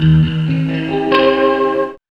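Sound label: guitar jazz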